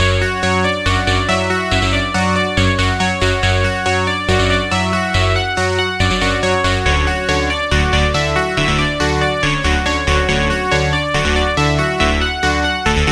Key, Serum 2; Key - Modern Saint; Routed Sound; Serum 2 Expansion - Reality Maker Vol.1

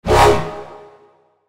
Wobble - Mechanical Drive; Routed Sound; Vital Expansion - Chaos Bringer Vol.1

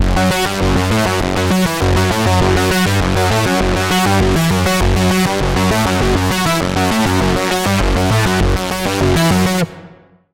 Lead, Sylenth1; Routed Sound; Sylenth1 Expansion - Doom Carpenter Vol.1

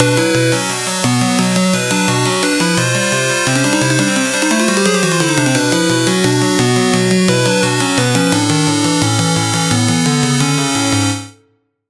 Key; Routed Sound; Sylenth1 Expansion - Hyper Gamer Vol.1

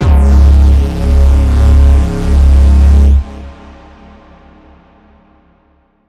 Fusion Reaction; SFX; Routed Sound; Spire Expansion; Chaos Bringer Vol.1

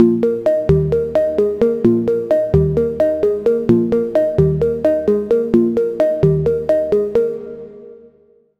Key, Sylenth1; Routed Sound; Sylenth1 Expansion - Story Walker Vol.1

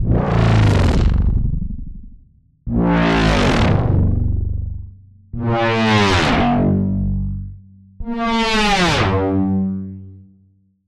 SFX, Serum 2; SFX - Y Switch; Routed Sound; Serum 2 Expansion - Quantum Enforcer Vol.1

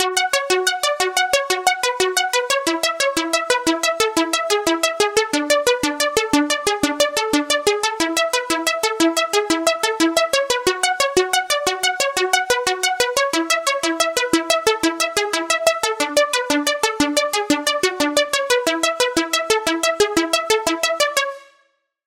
Pluck, Sylenth1; Routed Sound; Sylenth1 Expansion - Star Scavenger Vol.1

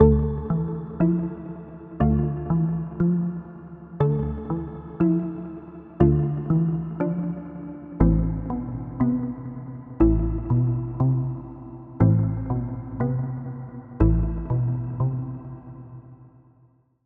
Pluck; Routed Sound; Spire Expansion - Street Vanquisher Vol.1

